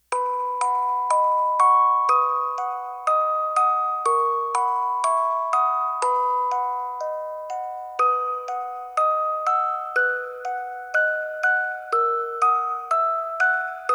music box version; Itsy Bitsy; Tiny Tunes; Narrative Mind

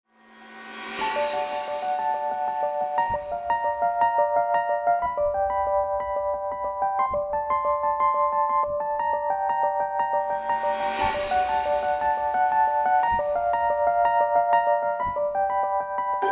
Season Of Us Underscore, Classical Cinematic; Narrative Mind

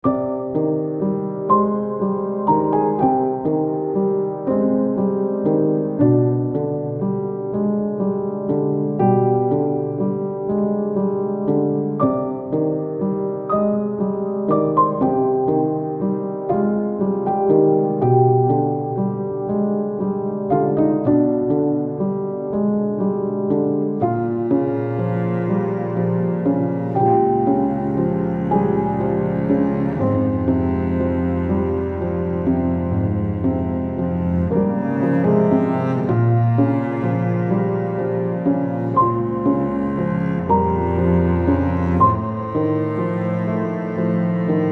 The Third Mirror Underscore, Classical Cinematic

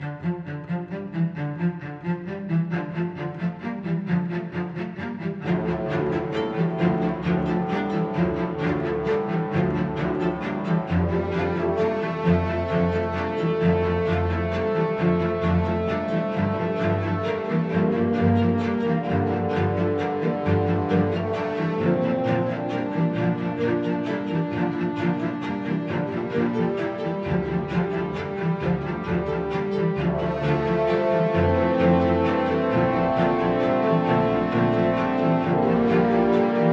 Underscore, Classical Cinematic; Narrative Mind

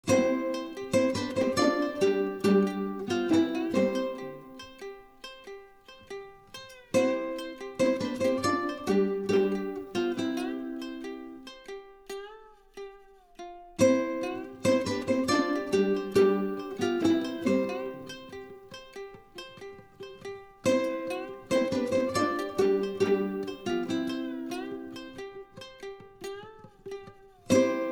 quirky underscore

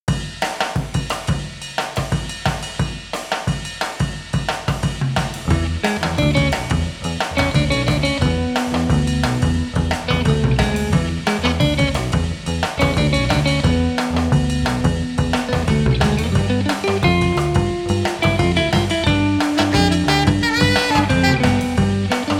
Pacific Waves; frantic; Narrative Mind

